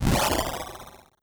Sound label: strengthen